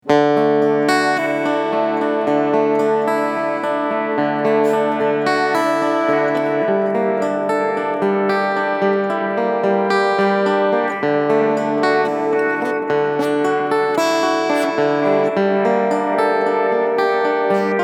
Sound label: ME 3 Moonwalk Effekt; LAVA MUSIC